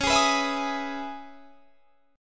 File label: Db Minor 9th, chord; strummed; JGuitar